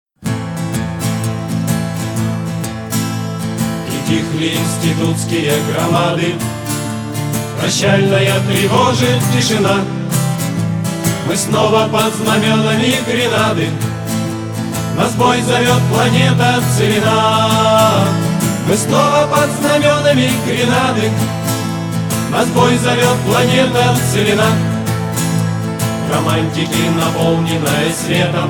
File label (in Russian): Gimn Grenady; Grenada